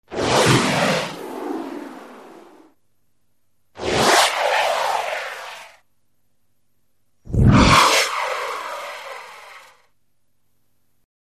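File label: Flaming Debris Flyby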